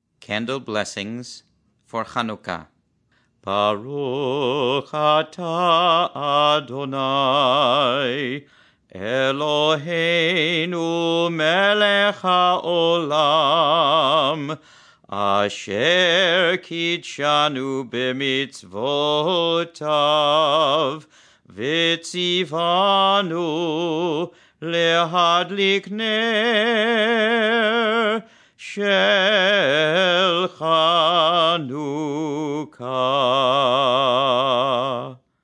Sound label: Candle Bless Chanukah Chant; mitkadem hebrew for youth; ramah